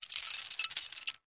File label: Med Chain Raised